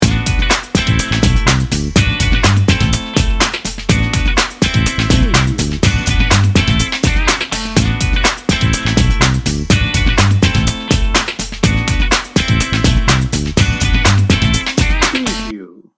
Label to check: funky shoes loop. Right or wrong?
Right